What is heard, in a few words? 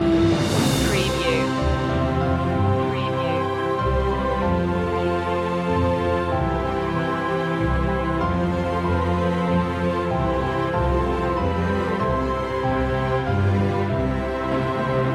orchestral loop; the first noel; media music now